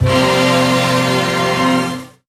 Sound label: brassy, Horns; Notes